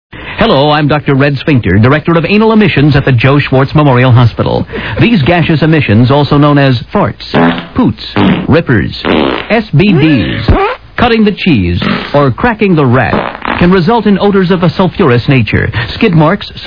fart

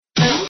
synthesizer

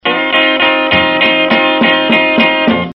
guitar

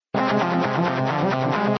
synthesizer

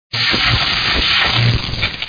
electric

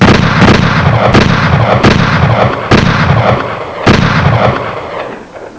shots

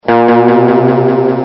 guitar

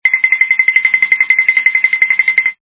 cartoon

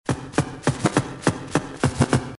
drums

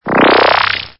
synthesizer

